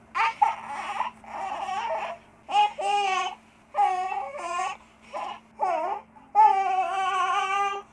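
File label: child; dite; Mafie 1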